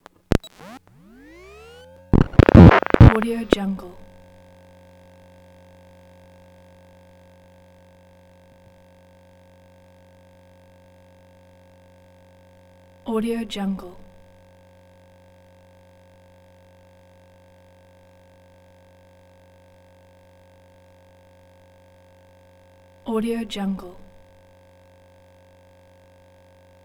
emf startup run and shutdown

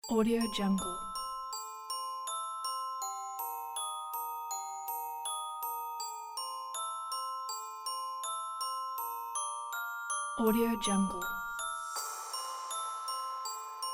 the scary

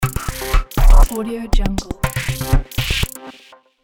minimal glitchy logo

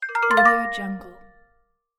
chat message

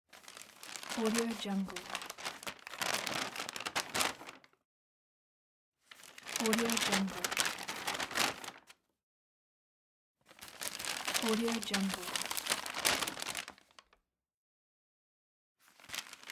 paper shopping bag open

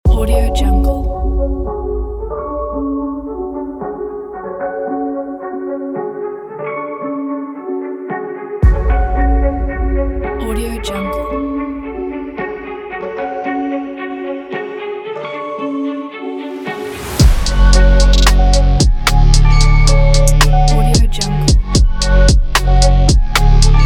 epicness beat; illiakopin

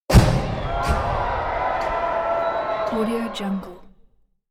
pyro explosion

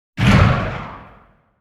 gun tails; soundmorph

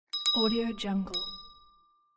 dinner bell; teasound

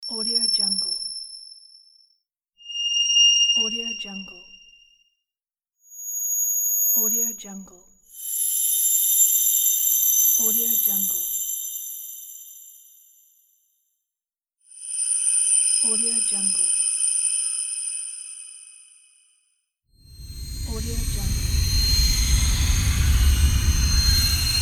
tinnitus

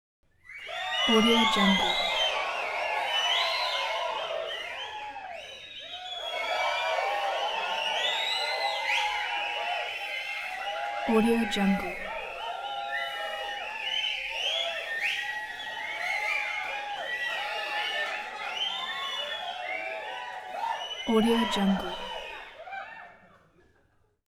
very enthusiastic crowd fifty people

